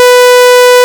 phase distortion constant parameter

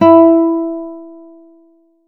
synth

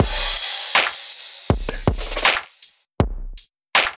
Drums processed

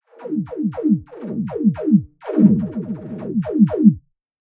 snare

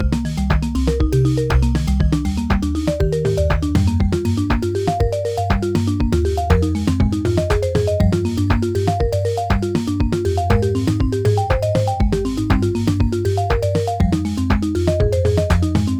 music unprocessed

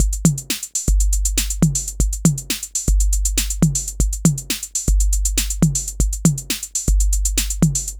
drums dry

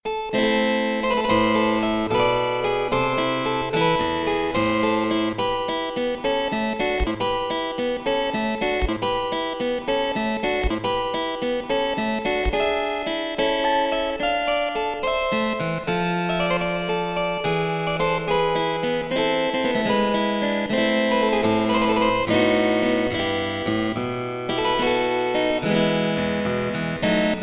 harp repeat; antiquing

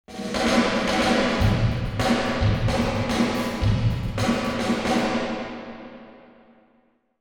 velvet drums

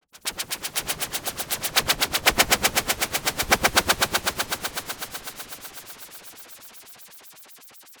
snare, 8Hz sine

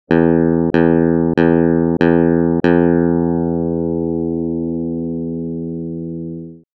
SYNTHETIC Dynamics, electric guitar; electric guitar synthesis

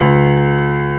PIANO; MEDIUM B1

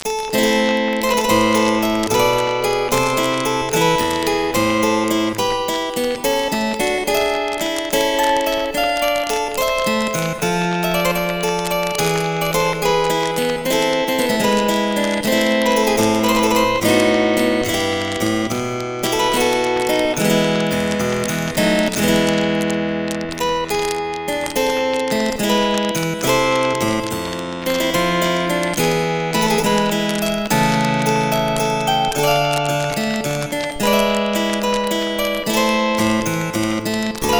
harp clicks; antiquing

